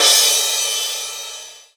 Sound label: Crash; Sonic Foundry (Sony Creative Software); Knutrix Drum & Bass